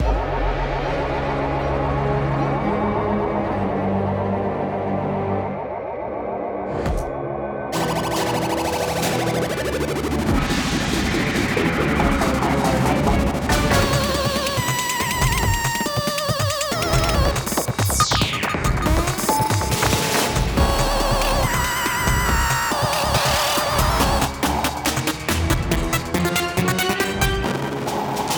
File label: Music Martian Madness